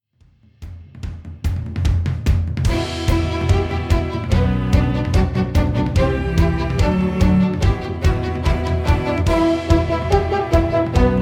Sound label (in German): Piratenlied Instrumental